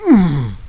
alpha pain; paranoia

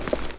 movement

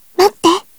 reload, m4a1

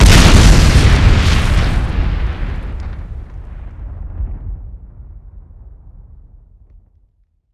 explode

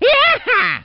cock gren; luigi; supermariobros

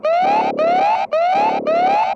alarm

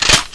shlak

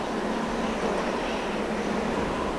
wind

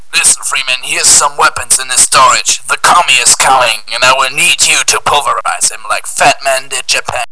radio

